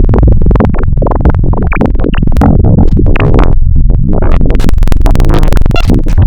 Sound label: FM Complex Sine, wavetables, Frequency Modulation; Architecture 32